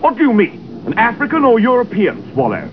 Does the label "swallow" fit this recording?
Yes